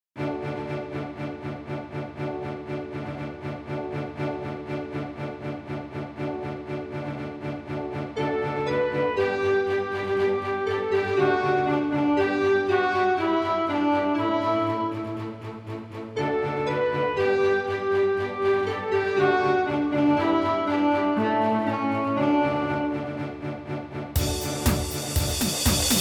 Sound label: karaoke; smile